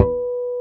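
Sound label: harmonic B4, fretless bass; Northstar - New Gold VOL-2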